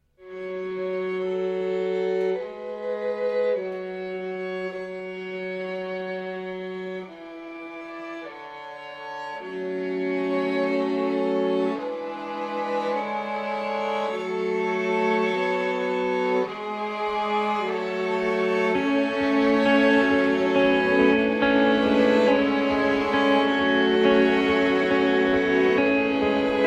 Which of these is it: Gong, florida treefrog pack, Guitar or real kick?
Gong